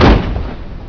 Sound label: CRASH